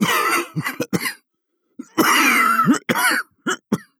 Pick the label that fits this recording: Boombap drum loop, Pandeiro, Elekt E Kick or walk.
walk